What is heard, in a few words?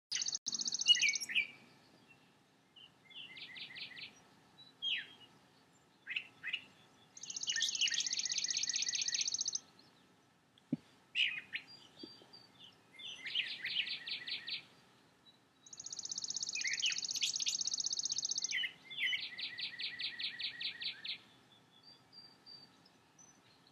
Bird Songs, New Hartford NY